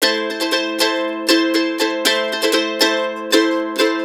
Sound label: RIFF, Blues Guitar Samples; ILIO; Fretworks; CEE RIFF